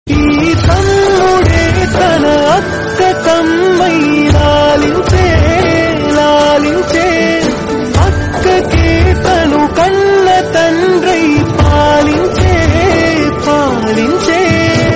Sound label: brother song, ringtone